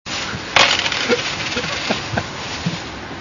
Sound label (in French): texture pieces